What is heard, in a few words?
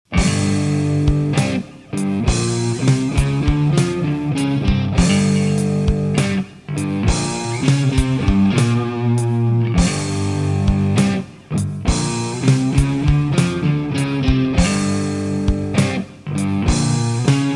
instrumental remix